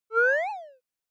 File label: Motion Slide Low